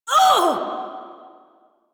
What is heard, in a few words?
Pain Lich B